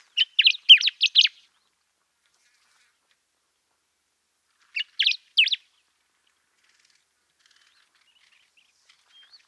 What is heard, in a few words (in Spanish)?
Diuca, sonidos de la patagonia